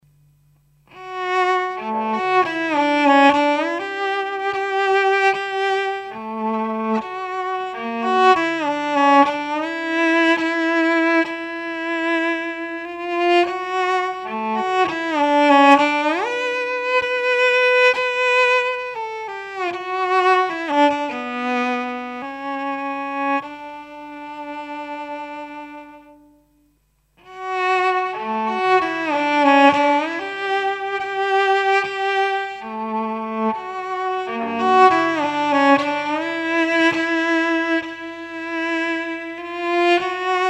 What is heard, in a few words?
cello